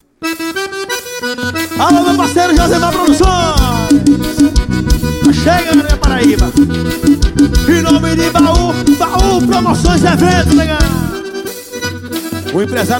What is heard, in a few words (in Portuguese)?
forrozao ao vivo recife